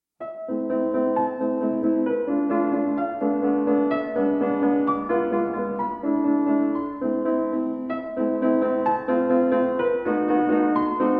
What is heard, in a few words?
Violin Sonata No. 2 in A Minor, I. Allegro Moderato